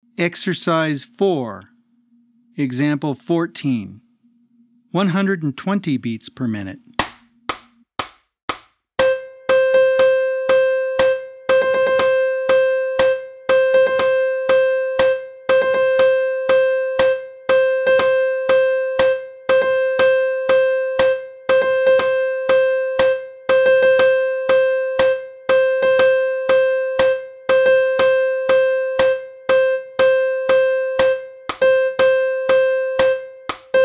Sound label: 120 BPM